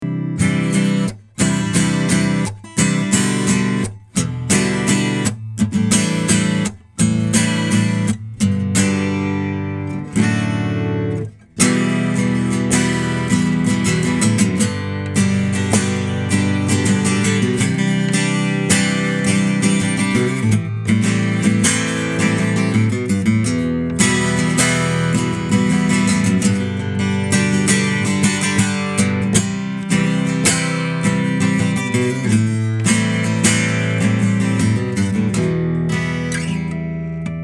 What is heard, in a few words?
Sample, guitars